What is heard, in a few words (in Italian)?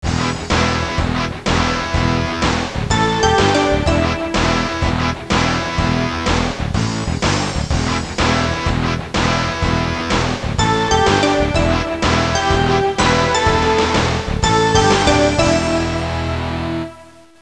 suonerie musica rock